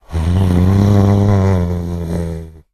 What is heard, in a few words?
idle, zombie; justzombies